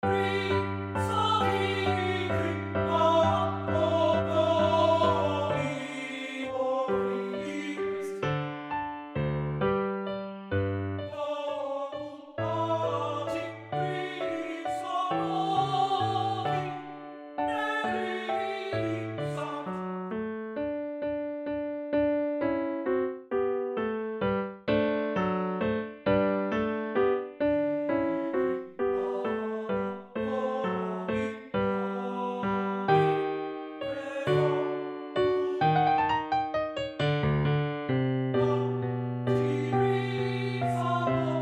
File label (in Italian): Lied per voce e pianoforte; Beethoven; Schilderung eines Maedchen